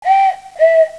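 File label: cuckoo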